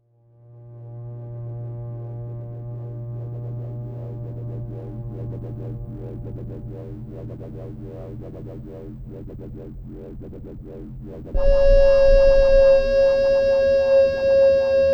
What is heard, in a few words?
Buchla200 Seq; Synth